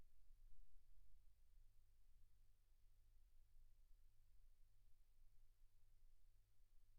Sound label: silence 48kHz